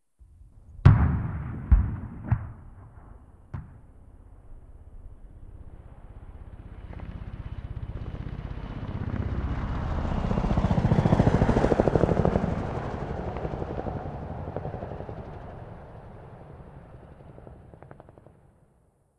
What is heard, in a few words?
idle human; cstrike